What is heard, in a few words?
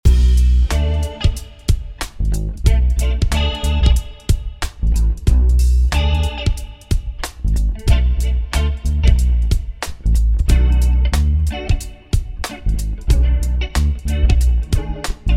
hungarian major jam track loop